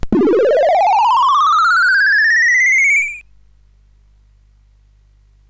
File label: SFX; Narco Police